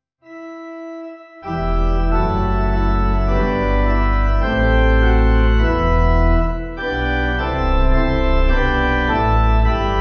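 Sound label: Pipe Organ, Music Backing Files for Churches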